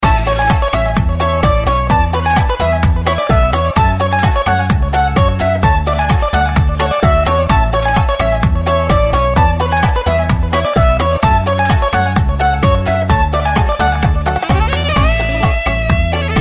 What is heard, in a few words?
bachata style